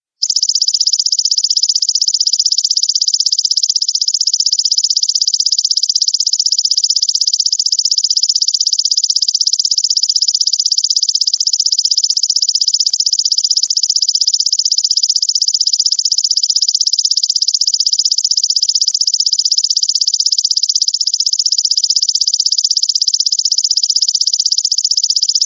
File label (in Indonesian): suara belalang daun